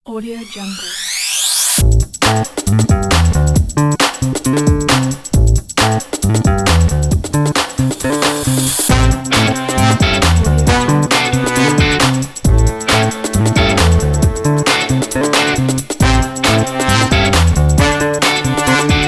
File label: the funky podcast intro